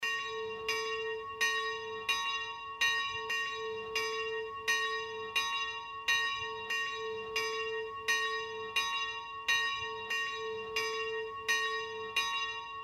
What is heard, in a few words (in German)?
Klingelton; St.Agritius